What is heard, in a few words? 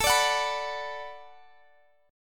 B-Minor